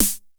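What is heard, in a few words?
snare drum; East West; Global Sounds; TR-808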